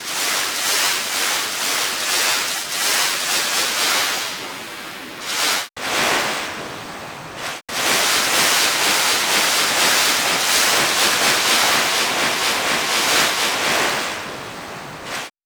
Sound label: sea shore